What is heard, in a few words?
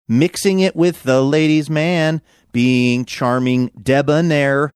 singing mixing with ladies man